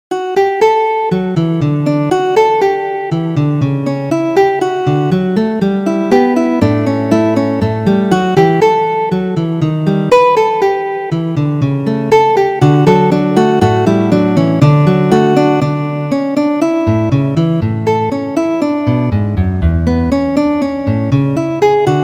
guitarra duos; Ferdinando Carulli

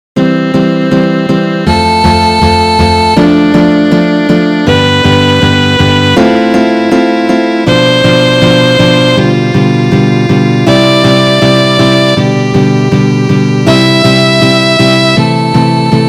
ejercicios; Ferdinando Carulli

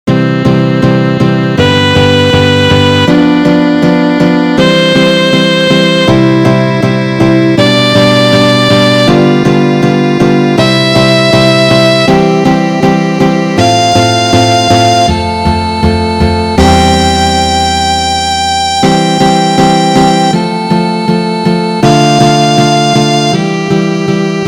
ejercicios; Ferdinando Carulli

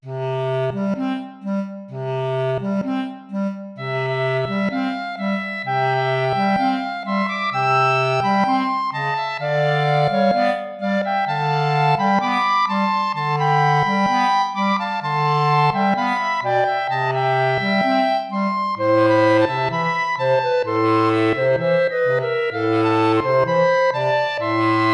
tango

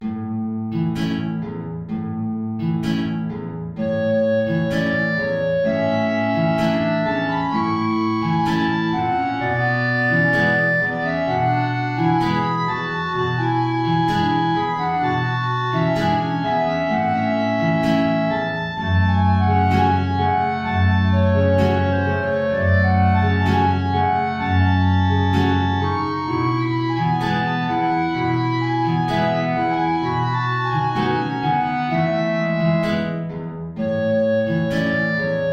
tango

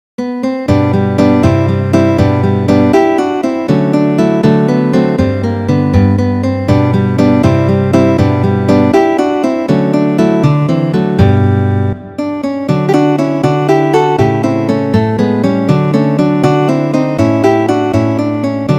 contradanzas; Ferdinando Carulli

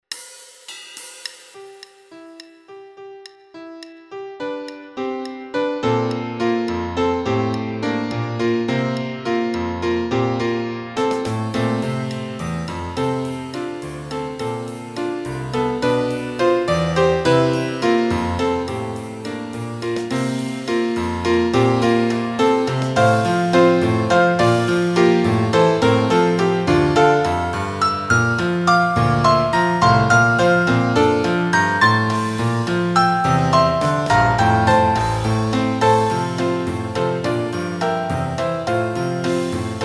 para piano percusion; cinco piezas latinas

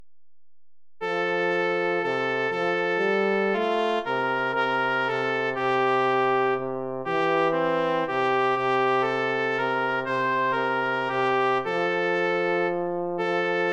danza cortesana para trompeta trompa

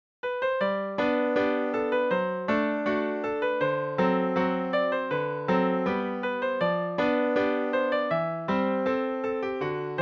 bailecito; victor dominguez; cortesano

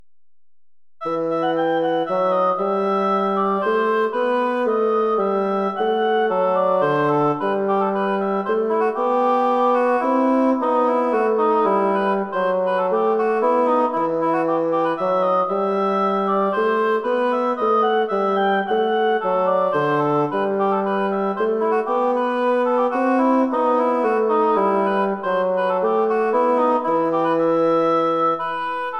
pequeña aria para oboe fagot

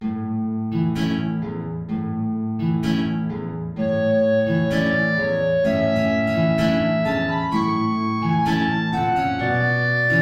tango

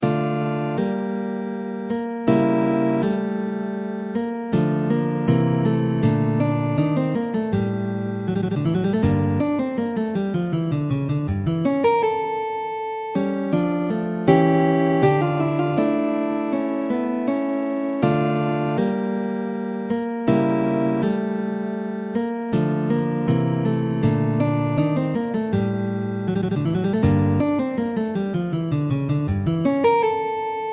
sonata violin sarabanda; bach johann sebastian; bwv1004

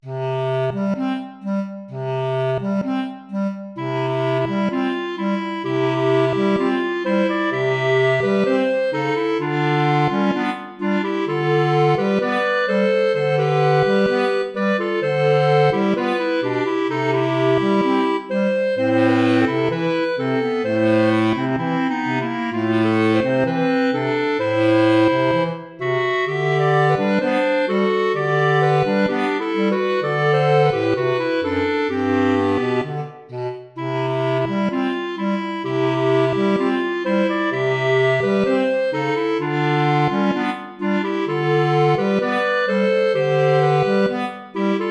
tango

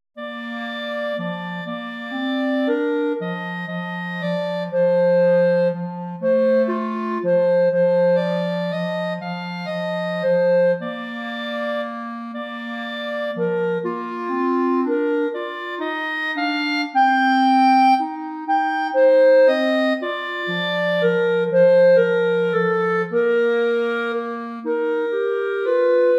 danza cortesana para clarinetes

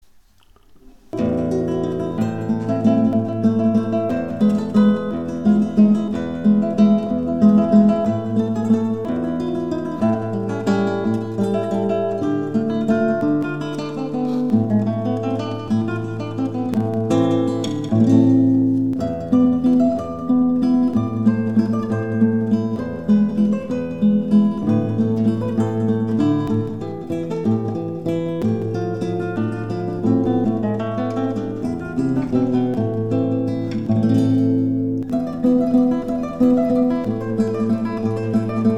vals venazolano

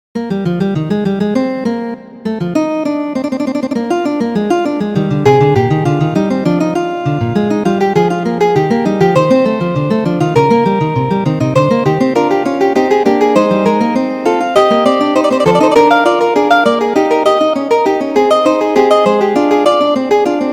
fuga; bach johann sebastian; clave bien temperado 2

